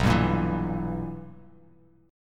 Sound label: B Major 7th Suspended 4th Sharp 5th Bass Standard, chord; BM7sus4#5; strummed; JGuitar